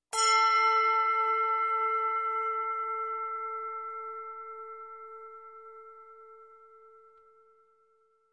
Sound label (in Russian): Zvon kolokolov Odinochny